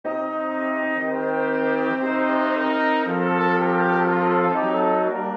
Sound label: Abide With Me, Brass Ensemble; Bach